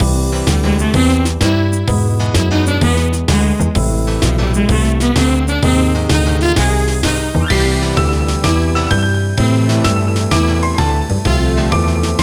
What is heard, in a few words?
puzzle games music solitaire title